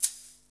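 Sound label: shaker, Hip Hop R n B; USB Soundscan vol.19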